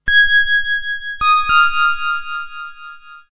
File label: crystal sms tone